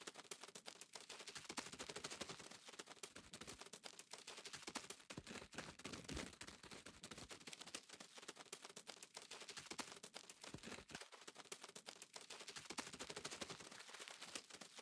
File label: Spider Move Loop; The Inn